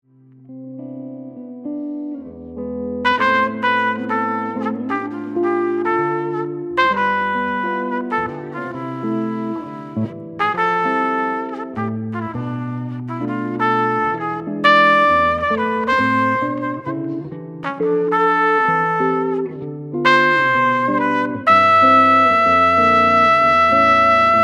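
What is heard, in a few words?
guitar trumpet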